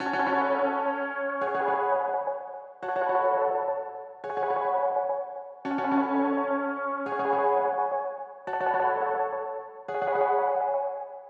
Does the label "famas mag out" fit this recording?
No